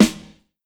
small reverb snare one shot G sharp key; Mono; snare drum

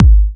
dry kick drum single hit g sharp key

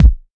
clear kickdrum sample b key

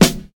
short snare single hit g key; snare drum samples

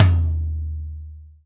acoustic tom one shot d sharp key; tom drum

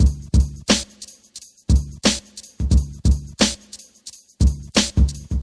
88 bpm drum loop c sharp key

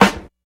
acoustic snare sample c key

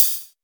treble heavy hi hat sample d key

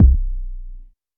boom kick drum c key

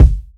dope kick single hit f sharp key; kick drum samples

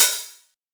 muffled open hi hat sample b key

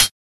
old school soft closed hi hat sample g key; tuned drums

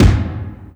verby kick drum sample f key; kick drum samples

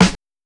dark acoustic snare sound f key; snare drum samples

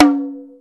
short reverb tom sound c sharp key

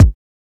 old school bumpin bass drum g key

